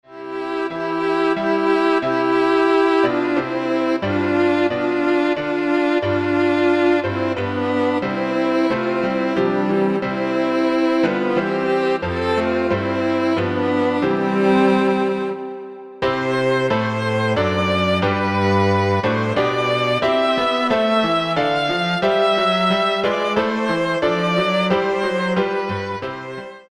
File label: Piano Trio